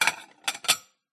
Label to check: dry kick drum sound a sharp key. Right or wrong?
Wrong